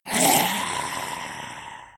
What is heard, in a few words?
zombie sound; zombieroad